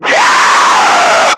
Screamer Death; Warframe; Lasrian

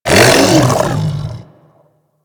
Infested Femur Vocal Attack; Warframe

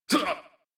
Pain, en; Warframe; New War; Teshin

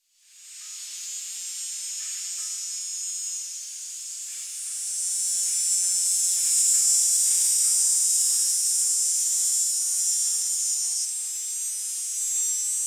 Tree Cicada; Warframe; Ambience; Nineteen Ninety Nine

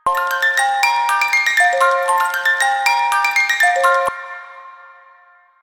Bunraku Puppet Loop; Warframe; Koumei

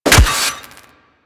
Melee Staff Impact Robotic Flesh

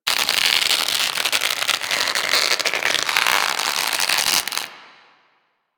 Grapple Rope Retract Loop

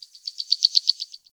Forest Open Glade Bird Close, Day; Ambience

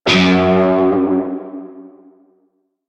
Shawzin Flourish Oct One F Sharp; Music; Warframe; Narmer Shawzin

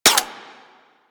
Rifle Mech C; Drake

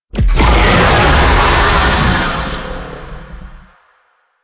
Infested Oni Vocal Phase Two Transition; Warframe

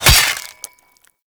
Melee Axe Impact Flesh

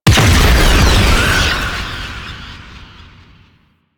Octopede Wail End Burst; Warframe; Man In The Wall

